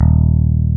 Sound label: D#2, E-Basses, Roland